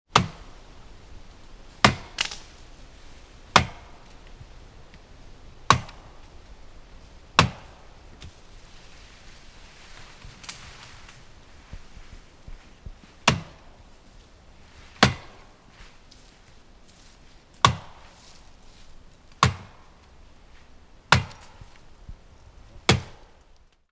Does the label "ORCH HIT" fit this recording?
No